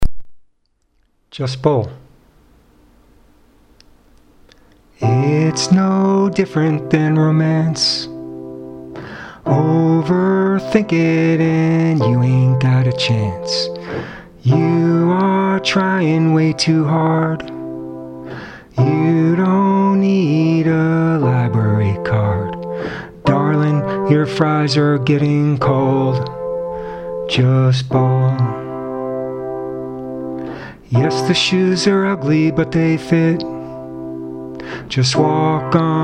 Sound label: bowl